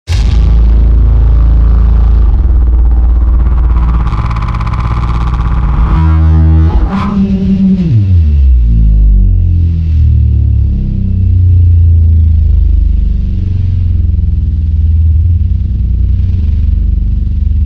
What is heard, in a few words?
House Music